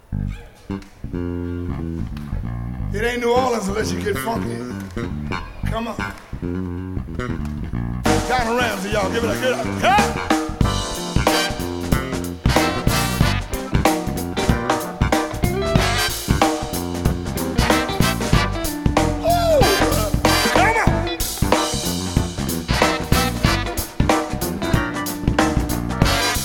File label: jazz blues